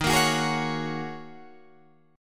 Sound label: Eb Minor 11th Eb down Guitar Drop D